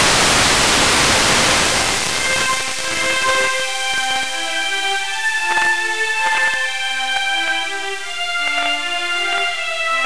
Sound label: DigiSinger, Japanese Flag; HORYU-4; JE9PEL